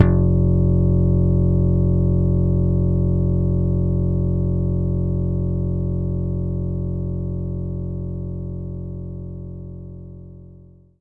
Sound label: Slap Bass F2